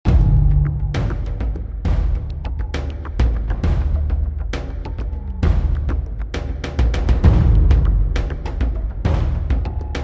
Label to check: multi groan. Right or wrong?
Wrong